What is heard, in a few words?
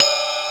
Casio SA-10 Ride